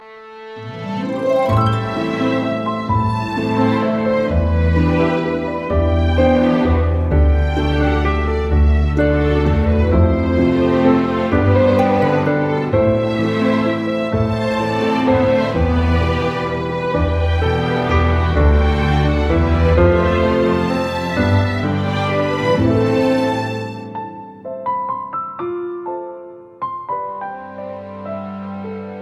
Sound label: waltz; inderella; alternative